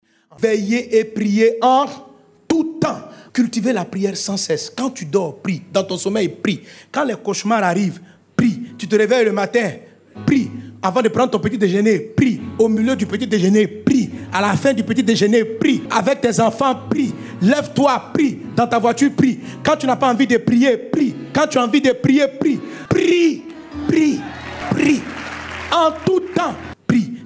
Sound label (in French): REVEIL SON